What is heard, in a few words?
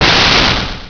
kiai